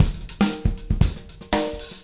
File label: 120 hat roller, drum loops and breaks; 111 to 120 bpm